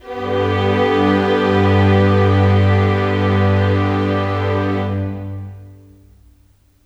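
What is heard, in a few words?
Pad G major, Orchestral; Sonic Foundry (Sony Creative Software); Orchestral Series VOL-4 - Rock and Pop; Pads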